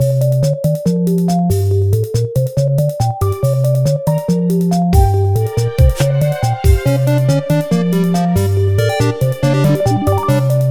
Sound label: Menu Hard; Super Meat Boy; PS Vita